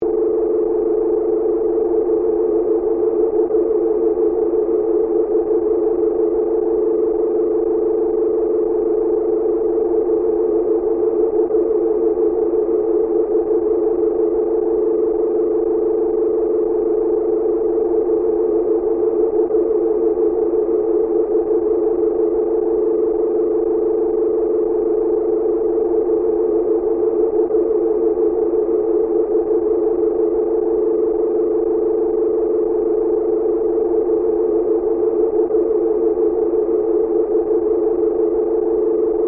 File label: Energy Modulation, Scifi, Energy, Modulation, Flutter, Drone, Science, Fiction